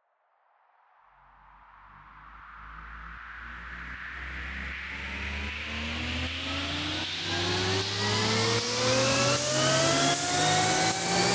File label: Future Bass FX Riser